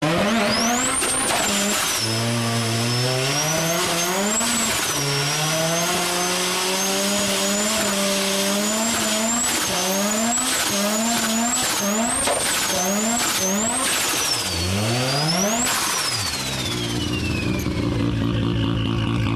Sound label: Rx7 Turbo